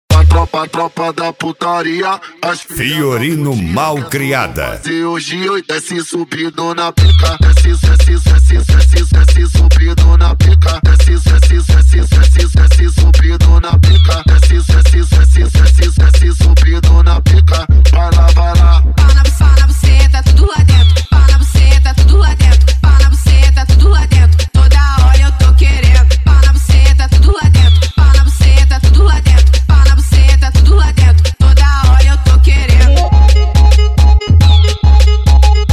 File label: mega funk bass